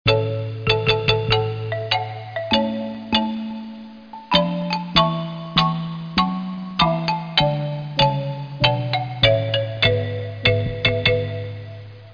Anthem

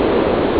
trav sand; RedLine demo

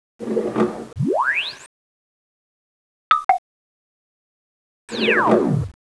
Laser Lab Sound

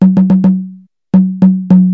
rythm

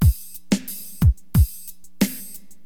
LOOP, HipHop Drums; LiveAct Pool 1

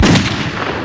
gunshot; The Fishin' Hole

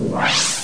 lampa on; boulderdash3d

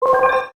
Btn Press; bailer

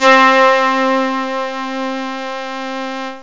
707 Solo Violin

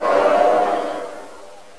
DRUMCN; Civilization II

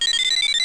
SPEED DIAL; Comedians - The People Behind the Laughter

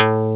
pluck, guitarras

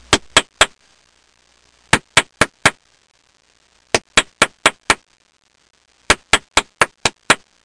Hand Claps; Soundsation; Human

